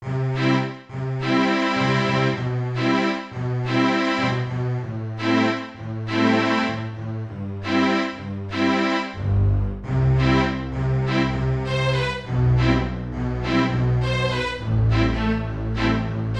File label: Seamless Strings; Yamaha TX16W